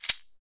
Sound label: Afro Shakers, Shekeres; Roland LCDP14 Africa VOL-2